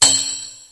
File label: kit, Yamaha Motif-ES8